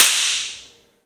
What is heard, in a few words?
CLAP SHRT; E-MU Producer Series Vol. 5; 3-D Audio Collection; 3D Multis; 3D Sprint Select 2